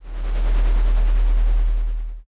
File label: earthquake